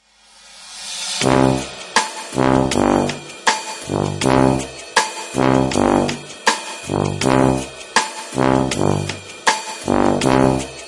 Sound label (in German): ALMCHILL RHYTHMISCH